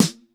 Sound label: TEK.S, TECHNO; 90 sSampleCDs; Crypton - DrumBase5000